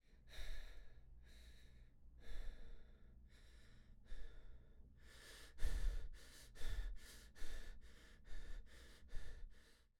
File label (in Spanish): Suspiro